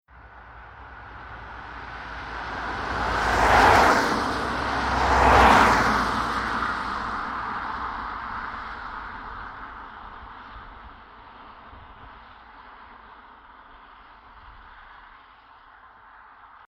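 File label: Car Saloon Passbys Two From Right 40MPH Wet Asphalt; Transport; Car Sound Effects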